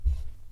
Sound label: Underneath stairs footsteps; Phasmophobia